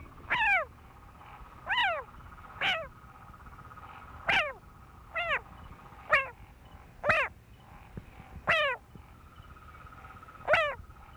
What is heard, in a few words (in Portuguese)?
Boana faber canto agonia